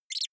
mouse squeak

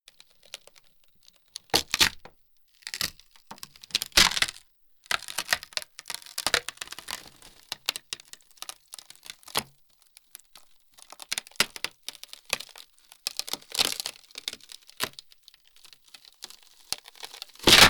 wood splinter low

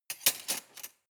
cutlery large drawer rattle hard

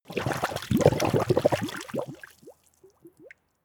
liquid slurp large

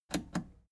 plastic button scratch smooth click enable slow

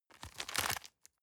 plastic bag small turn medium

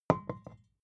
vodka put down tipping hard

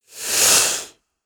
inhale male

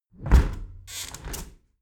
window close heavy sequence

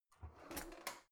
old drawer creaky soft half out

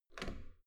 metal door heavy handle up soft

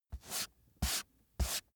book dust off sequence

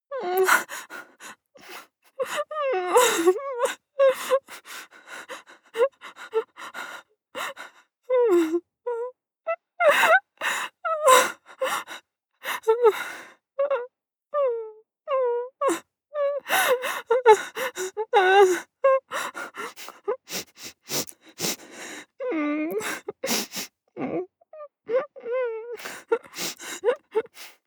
female whine

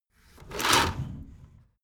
window roller shutter open soft single pull

